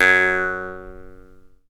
JEWS HARP, Earth Tones; Jew's Harp & Spoons

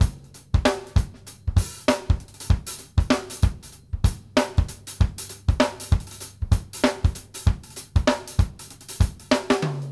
BEAT B, GOGO 96; Sampleheads; New York City Drumworks VOL-1

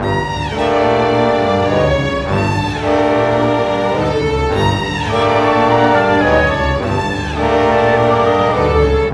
Cinematic Orchestra, Loop Samples, Orchestral; Sonic Foundry (Sony Creative Software) - Crimson Blue and Fabulous Horncraft 4 RnB

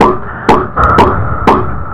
FX BEAT

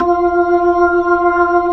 B-3 Jazz F5; Keyboards of The 60's and 70's

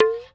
TABLA HAMM UP; Spectrasonics; Heart of Asia; INDIAN SET

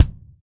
LD Kick; Roland BK-5

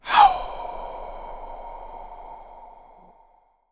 ATTACK HOW, VOCAL WIND; ILIO; Vocal Planet; Jazz & FX

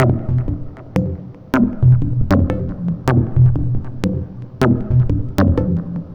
Mod Loop, Modular Percussion

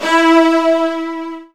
E2, Virtuoso Strings; ILIO; Kirk Hunter Virtuoso Strings VOL-2

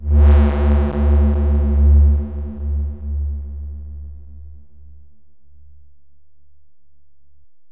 Bell, Alesis DM-PRO

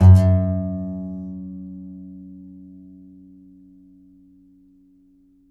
C. Bass Balalaika, Plucked Strings

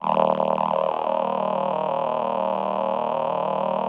VP-330, String Machines

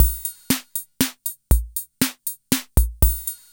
ROCKWALTZ; INIS - Funky Xtreams

